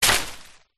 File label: foley bush small dense hit with wood post